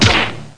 Blow

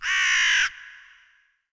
Rock bird; ImpGal2; colony

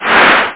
FIREBAL; medieval

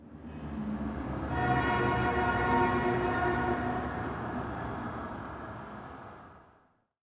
city, zones; Zoom3City

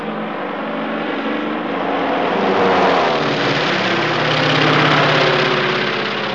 movement

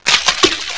bad splash

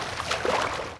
stroke; ambient

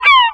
Seagull; Ambient; PC Gamer IT CD 46

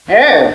euuhhh

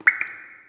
water; allods2d

